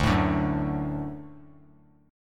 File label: D Major D down, D Chord; D strummed; JGuitar